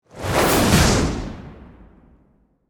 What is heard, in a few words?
Magic Spell Whoosh, Horror, Magic, Spell, Whoosh, To, Hit; BlastWave